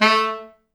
A2, Pop Brass; Best Service ProSamples vol.19; AKAI

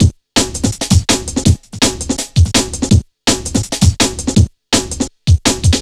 dominica, Drum Bass Drumloops 165bpm; Zero-G; Total Drum Bass

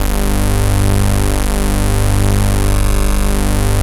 MOOG BS4 G2; MINIMOOG; Greytsounds VOL-1

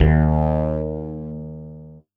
STRANGE BASS; Masterbits; Dance Trax

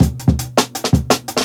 Trampoline; 90s Sample CDs; Original Jungle Breaks

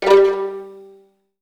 VN FX, Virtuoso Strings

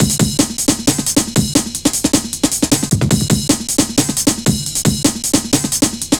FUNKY, breakcore 155BPM

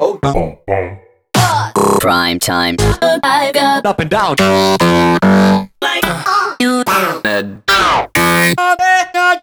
Synth Voc; Sliced

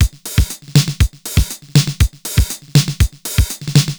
TR 505 (120BPM), beats; musicradar; cyborg beats

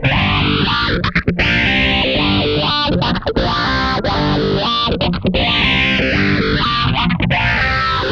INDIWAH, Funk Guitar; 90 s Sample CDs; Zero G

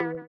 VIBRA WAH, guitar; Spectrasonics; Bizarre Guitar

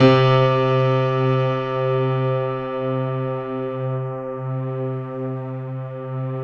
piano, Piano+String; Sound & Vision; Gigapack I CD 2

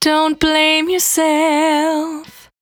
Cry 68bpm B, Vocal; Zero-G

